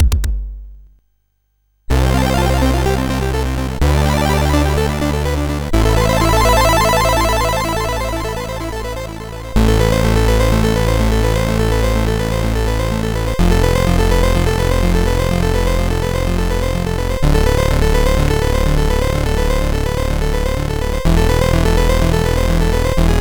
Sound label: tune, CSG8580R5